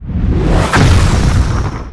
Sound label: skill shot fire